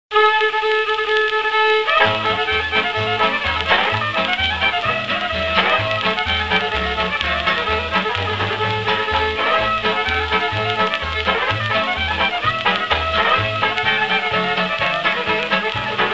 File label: instrumental